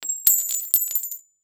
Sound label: Industry Metal Washer Drop On Cement